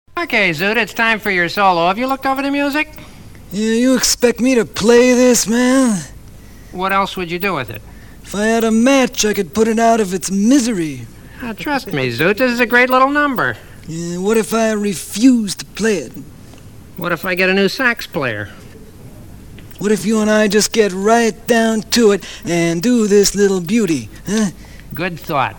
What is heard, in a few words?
Sax; muppets